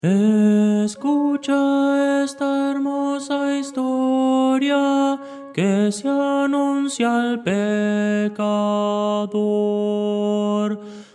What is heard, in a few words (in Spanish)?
tenor, himnos cristianos